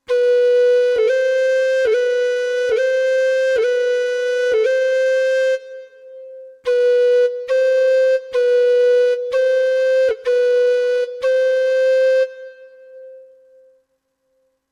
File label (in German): Vorschlagsnote; Artinoise Recorder